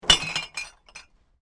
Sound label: Bottle Plink, Glass; Glass Sound Effects; Glass (Sound Effects:Materials)